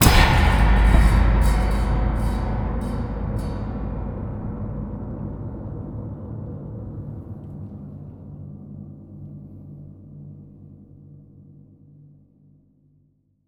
strike, Cinematic Metal Impacts; paranormal

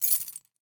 Keys Drop; Automobiles; 1001 Sound Effects

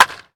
Baseball Bat Crack

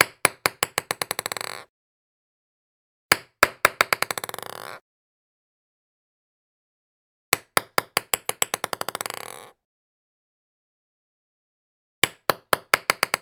ping-pong ball; devices accessories utilities; NS Studios - ultra sound collection

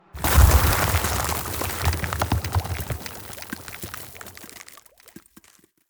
Ice Spell Explosion Impact Shatter Strike; Ice Explosions & Impacts; Arctic Aura

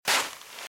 Footsteps Sand Dry Walk Right; RadwinIsland

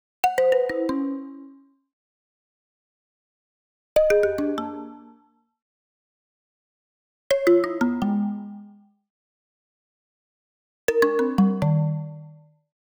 Arcade Jingle Negative; boom library; The Interface

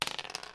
dice roll; Dice World

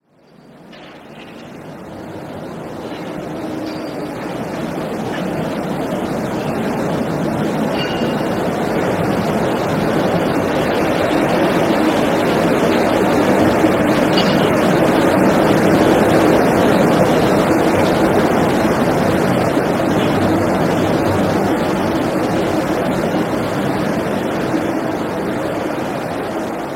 stereo street; Pitch Black - A Detective's Demise

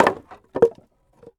IMPACT Wood Plank On Wood Pile Fall; mono; IMPACTS; Universal Sound FX

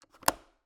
Cabin, window, latch, flip, lock, open, wide; Eiravaein Works; Vaeyan IV

